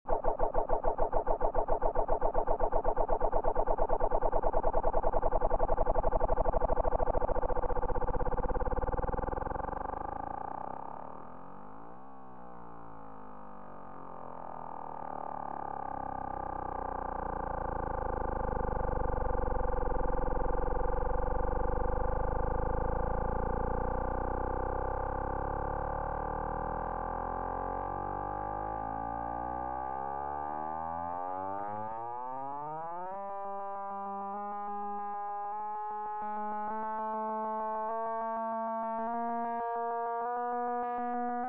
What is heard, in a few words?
Noise